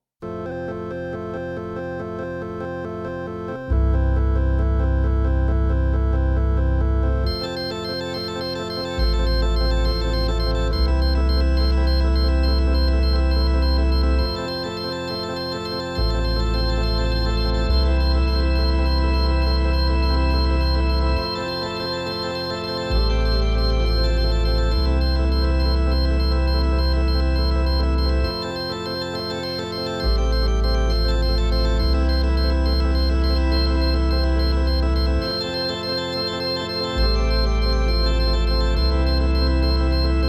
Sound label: mad rush for organ; nonesuch